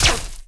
rico repeater Cannon

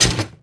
bad part metal

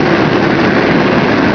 nitro; Chase Hollywood Stunt Driver; XBOX

